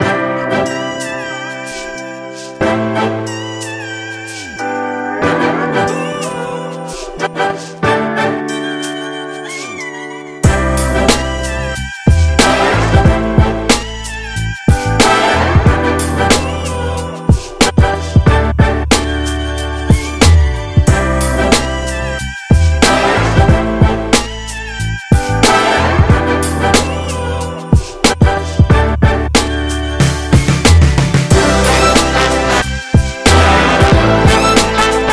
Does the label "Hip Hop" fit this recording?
Yes